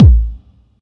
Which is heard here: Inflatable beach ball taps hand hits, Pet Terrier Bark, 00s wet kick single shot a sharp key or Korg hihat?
00s wet kick single shot a sharp key